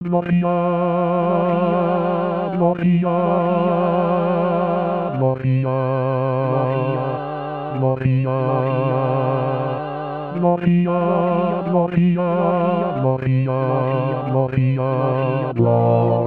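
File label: Christmas Fanfare, bass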